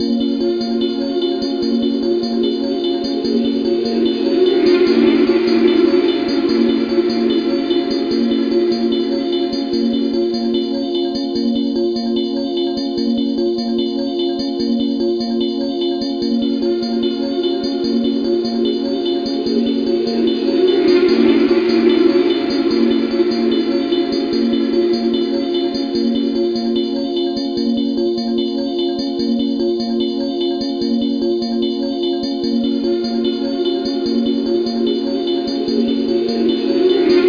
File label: Scream In Space; Hacker Series